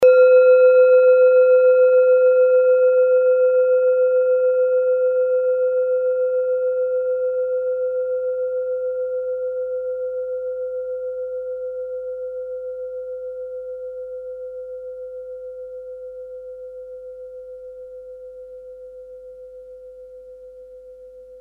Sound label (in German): kleine klangschale; Nepal